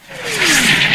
mortar shell incomming; weapons mortar; hl2

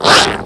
barnacle bark; hl2

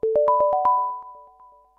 vote done; sourceforts1941; xenon

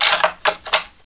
reload, sniper; hl2

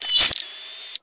blades in, roller mine; hl2

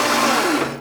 dog servo; hl2

